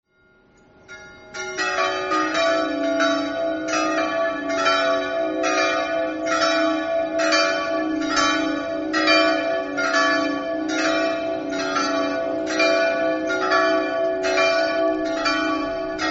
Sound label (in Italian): campane; muggia